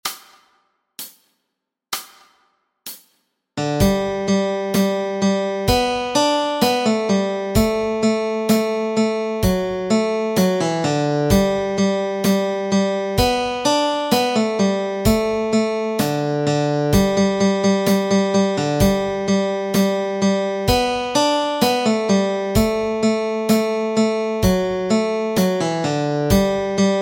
Melody Track

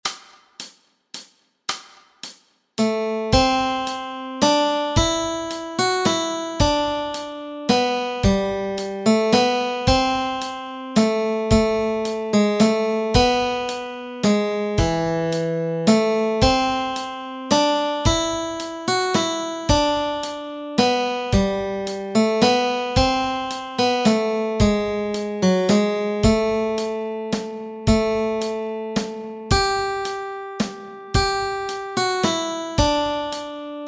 What Child is This melody

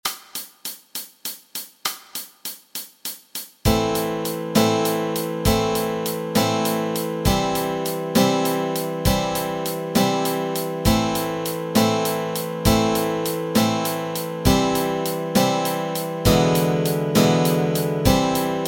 Chords Track, Easy Guitar and Ukulele

